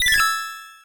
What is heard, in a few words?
Get Ready; Garrison; C64